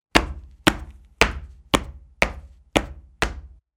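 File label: stomping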